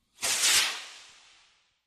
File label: Rocket Flare Gun: Firing By Into The Sky. Stereo; small rockets